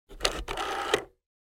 rotary phone

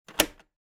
cassette player button

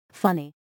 funny

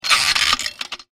meter

